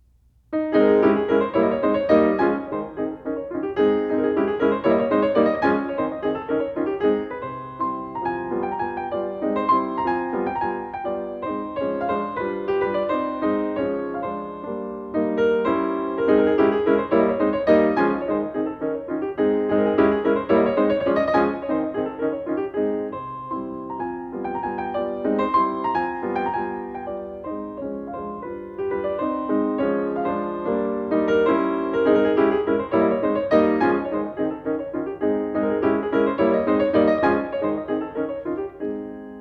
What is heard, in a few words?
Mazurkas for piano in A flat; Chopin